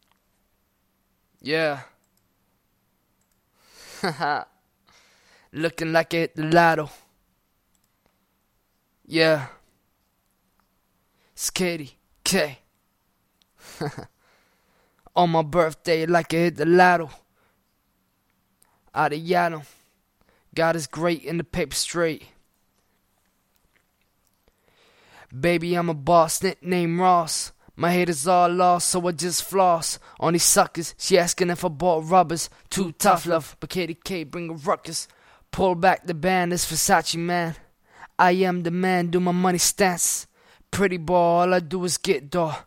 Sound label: Verse Not Clean 96BPM Key Gm, Acapella; Gender / Male; Style / Rapping